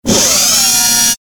servo agressive

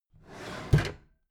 packed drawer smooth clatter open heavy

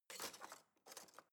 knife wood drawer place soft

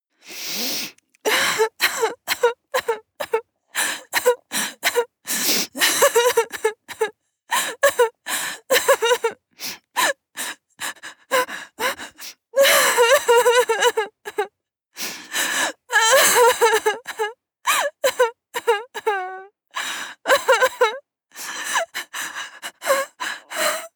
female cry sequence

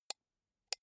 computer mouse right click slow soft

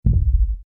heartbeat

